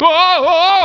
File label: slip big; mk2